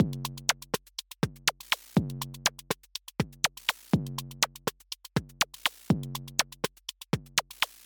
122 BPM Micro Drums; percussion essentials 1

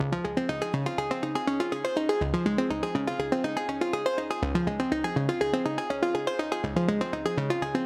122 BPM Arps C maj A min Tonic G; arp essentials; Cycles of Motion

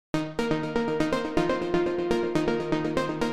122 BPM A maj G min Tonic D; mmb studio soundtrack essentials

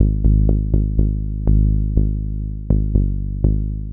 Bass Synth Dmaj Cmin Tonic G, 122 BPM; Pro Bass Synth Studio Kit; MMB

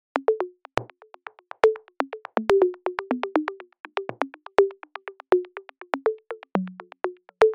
122 BPM Bongos; mmb studio melodic

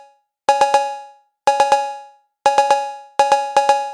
Cowbell, 122 BPM; mmb drum arsenal vol 1

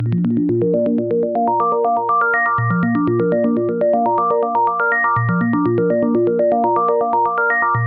122 BPM Arps A maj G min Tonic A; Aura; mmb studio melodic

